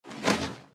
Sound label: deathclaw swing; npc